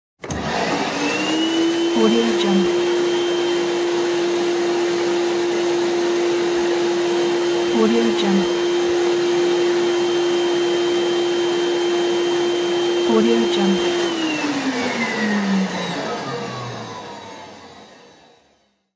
vacuum cleaner

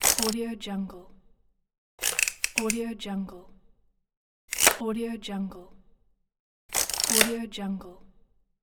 roll up

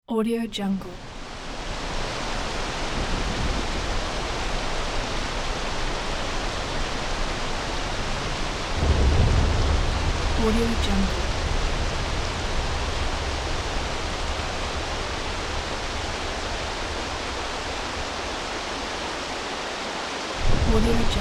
heavy rain with thunder

